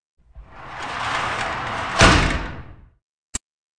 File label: Track, Doors; 헐리웃특수효과음; 문 소리